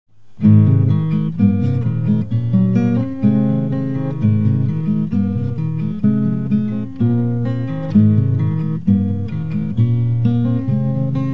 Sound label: accompaniment